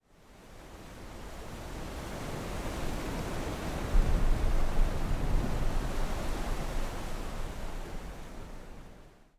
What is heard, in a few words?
wind hit